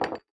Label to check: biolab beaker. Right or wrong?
Right